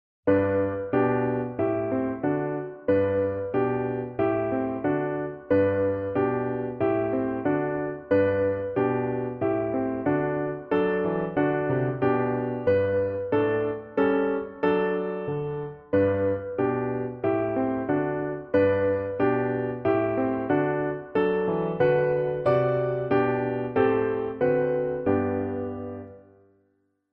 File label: Accompaniment Track